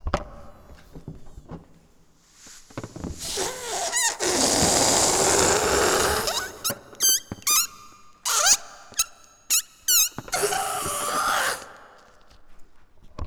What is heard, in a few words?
balloon; Africa